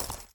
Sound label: item watch drop